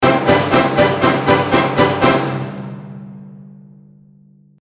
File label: ending jingle